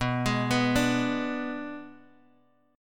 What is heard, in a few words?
B Minor 6th B, Guitar Open G